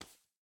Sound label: Hand Step; Game rip; MiSide